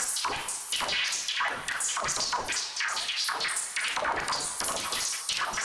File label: Tonal Re Draw, sparse soundscape